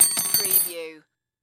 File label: Butter Knife Set Down